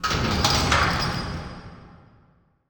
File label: Open Gate; Game Essentials